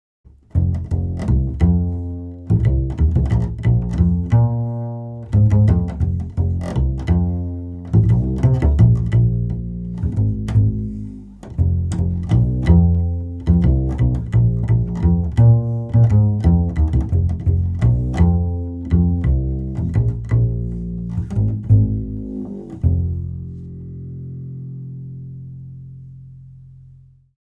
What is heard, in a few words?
Soyuz 013 FET Doublebass